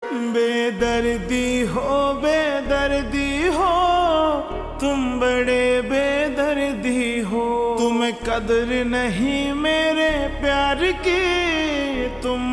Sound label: Slowed Reverb Ringtone